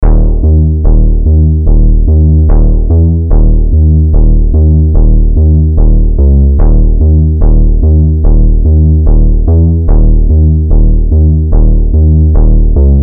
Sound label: Thinking Tension, 70s Sci Fi